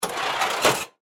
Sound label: Cash Register Close, Industry, Cash, Register, Close, Drawer; BlastWave